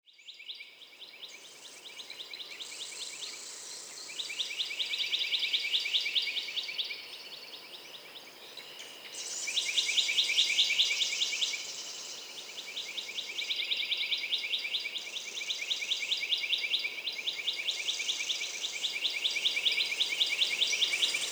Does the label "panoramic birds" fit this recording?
Yes